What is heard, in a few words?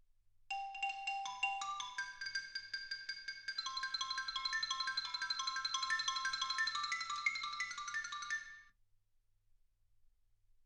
xylophone